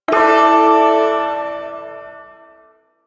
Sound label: Ersatz Shawzin Pent Maj Chord; Warframe; Duviri Ersatz Shawzin